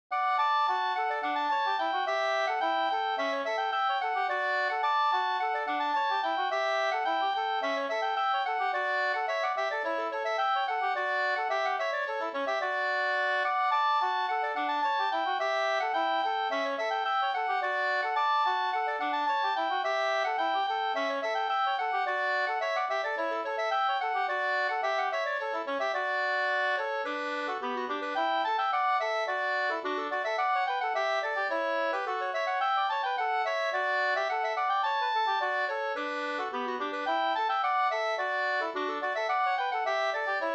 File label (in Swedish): stjuls